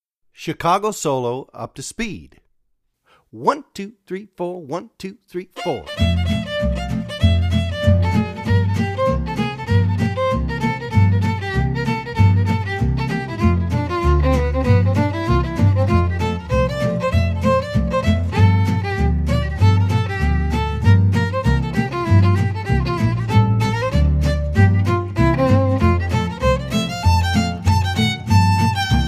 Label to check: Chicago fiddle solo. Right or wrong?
Right